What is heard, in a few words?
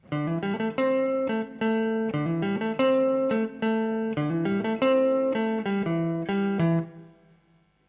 Clean Neck, nocaster